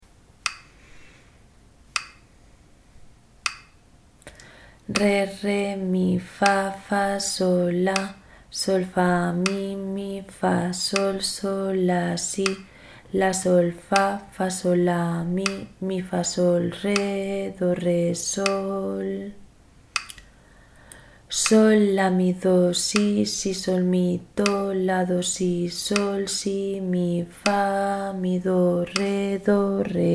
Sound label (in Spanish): ritmo 6-4